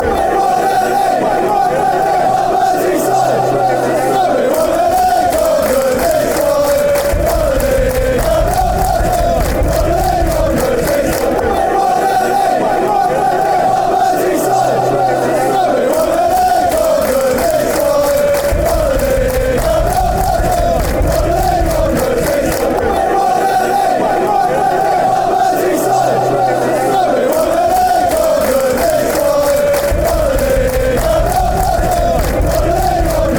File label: we won league, fanchants; merseyside